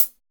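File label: ROCK CLOSED HI HAT; POP-ROCK; ROCK CHH F; Drums In Your FACE!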